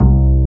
Bass E2; Mo' Phatt; Digital Sound Factory Mo' Phatt

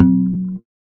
bass 3 (creep) F#; Sonic Foundry (Sony Creative Software); Ugly Remnants VOL-2; Basstuff

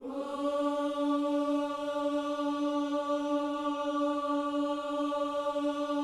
OOS D 4D, gospel; Spectrasonics; Vocal Planet

